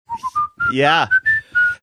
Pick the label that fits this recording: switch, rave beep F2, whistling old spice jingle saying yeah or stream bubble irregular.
whistling old spice jingle saying yeah